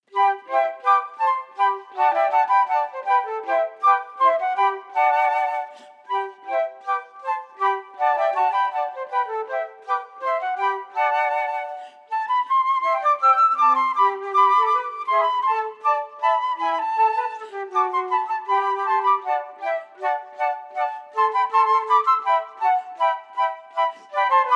Bourée, Chamber Music for 3 Flutes, complete version; Partita in F